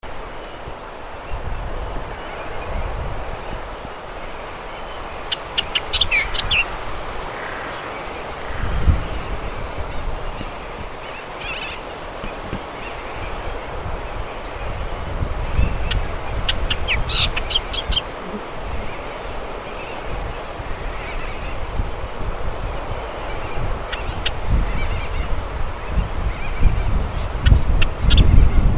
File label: Millerbird Nihoa songs; EVanderWerf